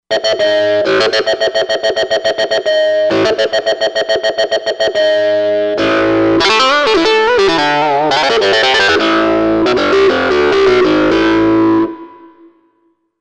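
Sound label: Lead Talk Mod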